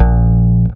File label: bass 3 (Bb); Sonic Foundry (Sony Creative Software); Ugly Remnants VOL-2; Basstuff